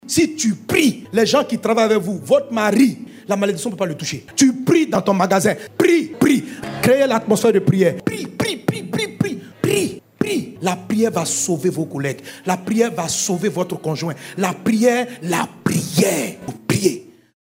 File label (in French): REVEIL SON